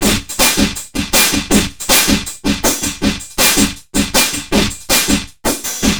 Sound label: Vintage Kit, drums beats